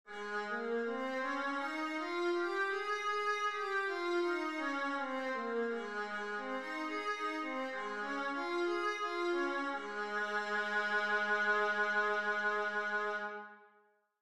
viola a flat major, scales

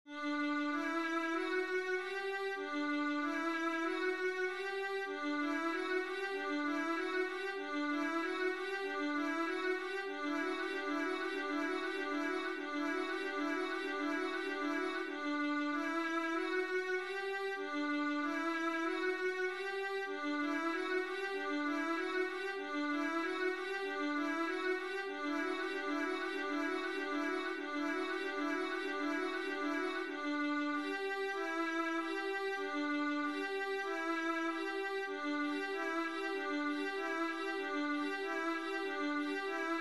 vla etude, viola

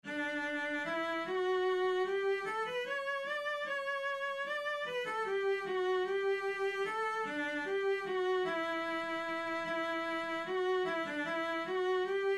minuet Vla, viola

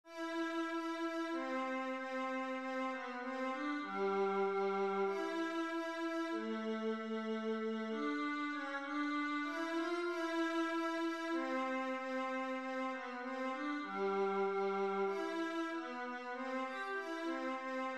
rosamunde andante vla

